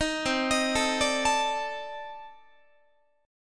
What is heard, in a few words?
C Minor 6th Add 9th C Guitar; Cm6add9 arpeggiated; 18,20,18,19,18,20